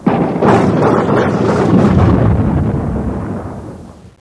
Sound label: thunder